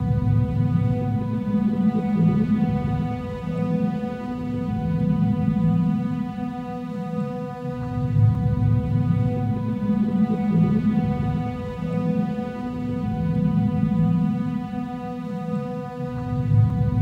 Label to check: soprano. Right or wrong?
Wrong